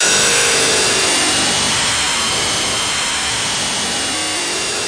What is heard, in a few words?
electric and annoying; Electro and Synthetic